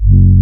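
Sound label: horn G1, synthesizer analog horn; AKAI S6000 CD-ROM - Volume 1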